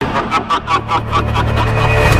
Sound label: mech laugh